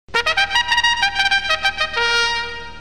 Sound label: trumpet